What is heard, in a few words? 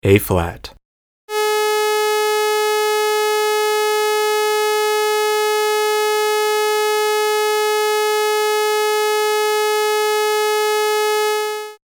flute clarinet, jargon wind tunings